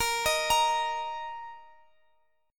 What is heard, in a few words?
D sharp 5th